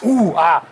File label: "ooh ahh" male